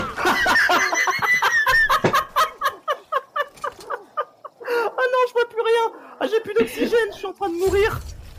rire

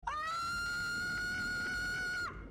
sirene

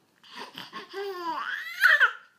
plaisir intense

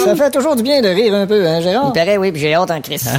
rire

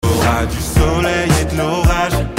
soleil orage, soleil orage

